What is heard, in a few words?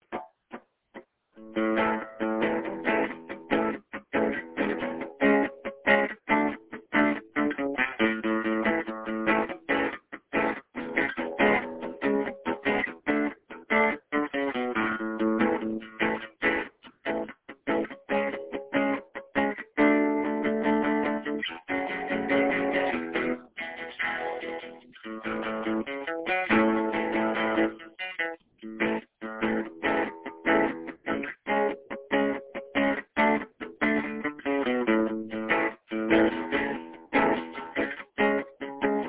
chug engine chug